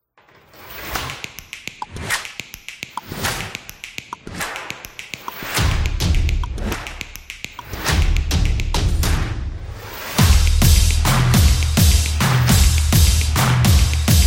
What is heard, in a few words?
This Is Percussion Upbeat; graffiti